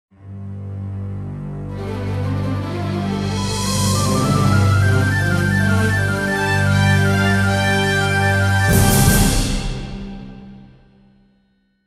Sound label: Jingle Plane Start; jack keane windows 2007